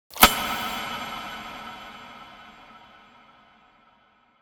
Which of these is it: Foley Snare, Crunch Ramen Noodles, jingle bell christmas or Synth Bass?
Foley Snare